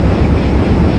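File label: ambience fan whoosh; tank farm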